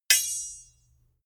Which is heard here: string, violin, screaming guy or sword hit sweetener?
sword hit sweetener